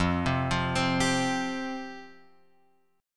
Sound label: F Suspended 2nd, chord; Fsus2 Chord {1 3 3 X 1 3} arpeggiated